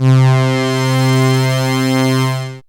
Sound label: synth c, Sweep Synths